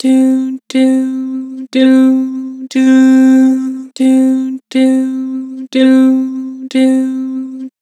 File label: "Doo", Vocals; Harmor